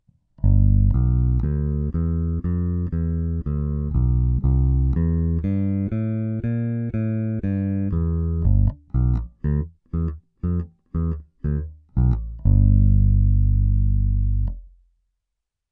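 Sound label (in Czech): Ibanez SRX 700 - čistý zvuk (dvojcívkové snímače Ibanez, struny D'Addario EXL-165, nickel-plated roundwound, trochu ohrané); NUX NPB-5 Melvin Lee Davis Bass Preamp & D.I. Box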